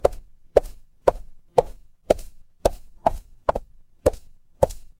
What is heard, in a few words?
footsteps concrete